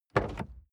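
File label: sports car exterior door open medium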